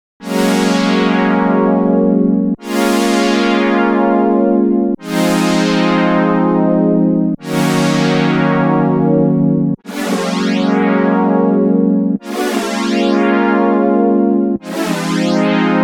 Poly Synth; Stator

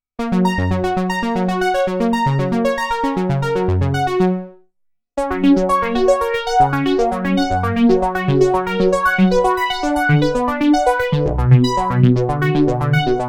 Arpeggio Synth; Korg; Whirl

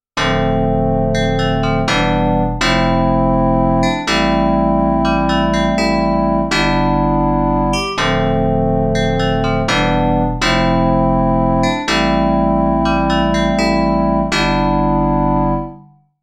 Almost Piano, KORG Node

